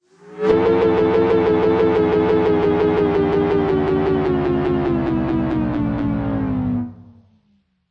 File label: space car malfunction; Computery or Science Fiction